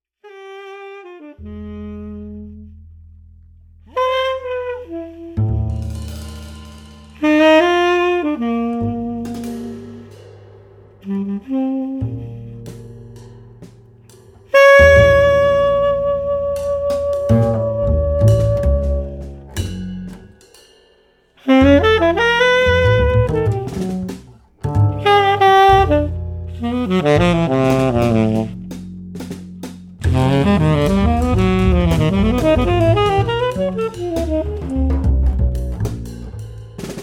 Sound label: charming chimes